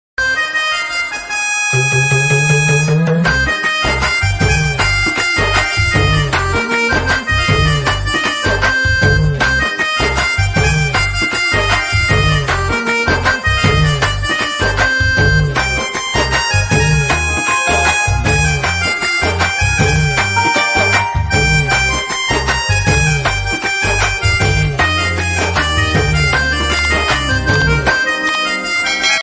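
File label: instrumental background music ringtone; rangasthalam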